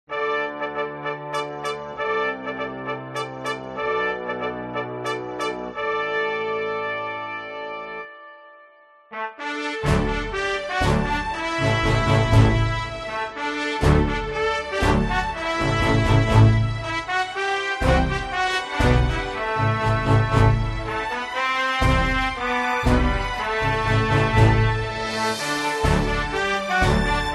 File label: superhero theme orchestral; zapsplat